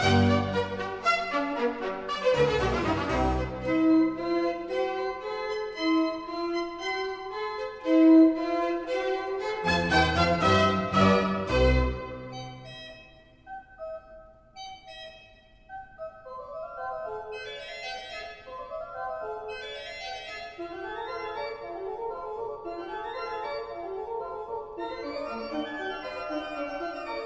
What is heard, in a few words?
Organ Concerto No 13 in F, Allegro